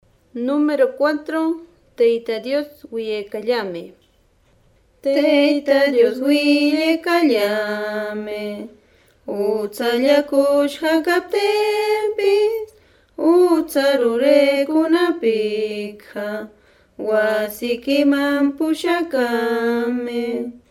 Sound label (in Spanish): cancion